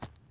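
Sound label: fluffy walk left, footsteps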